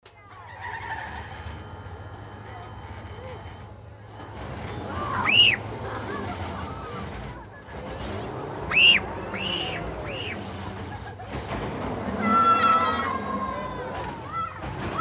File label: roller coaster